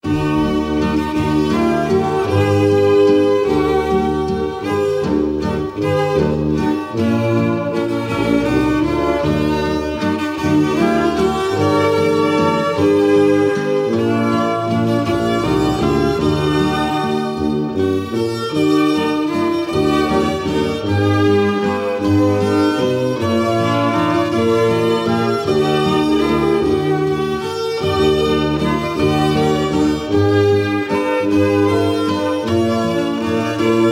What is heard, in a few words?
waltz; peggys waltz